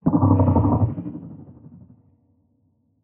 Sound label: damage; Barotrauma; Hammerheadmatriarch